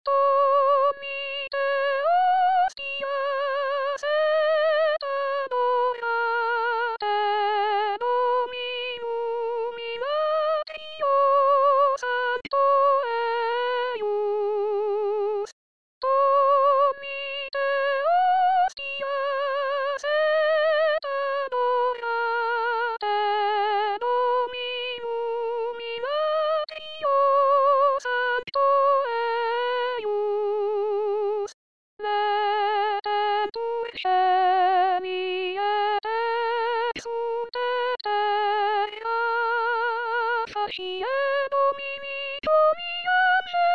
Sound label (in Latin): tollite hostias soprano